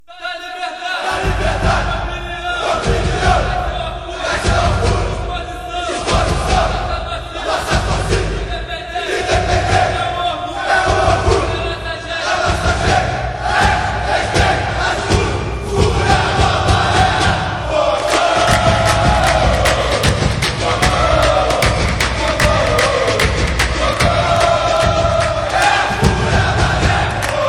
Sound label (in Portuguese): grito de guerra, fanchants; furia jovem